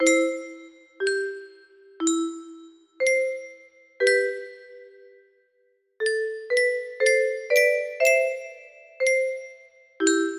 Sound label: in c major, music box